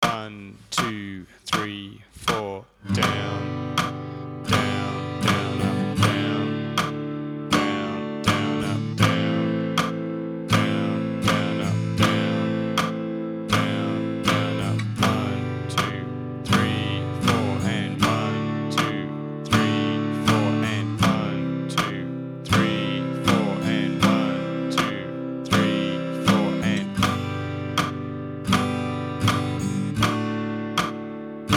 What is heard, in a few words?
tapping chords, guitar